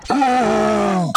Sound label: huegh